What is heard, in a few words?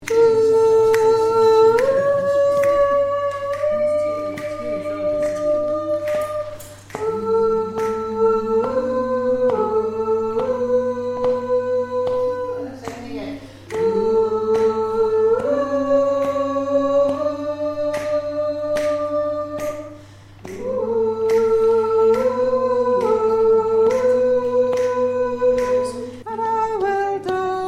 Tenor